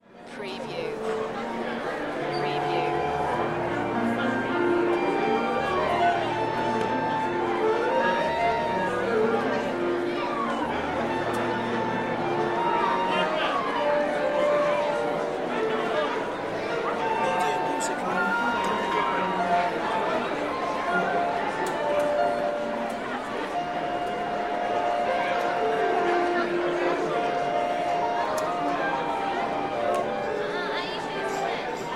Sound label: Concert Orchestra